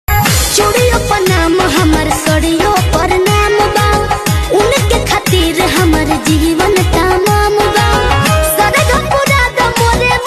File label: saxy groove music